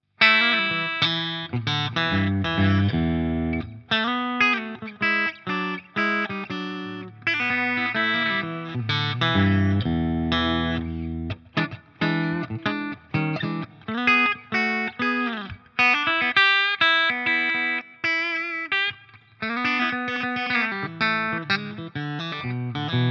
Steg clean; GL Tribute Doheny Shell Pink

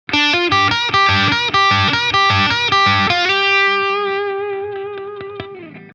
Mollpentatonik Triolen

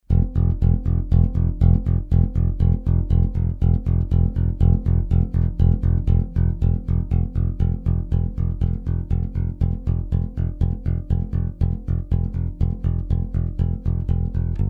Eich T1000 Compressor Wirkungsgrad mit Godin Fretlessbass

